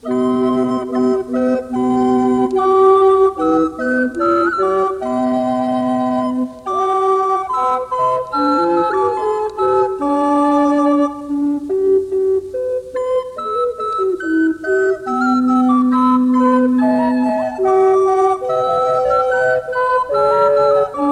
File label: Joyful Sound